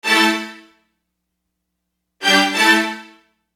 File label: Hi Stabbing; Construction Kit; HIP-HOP; Sonic Foundry (Sony Creative Software)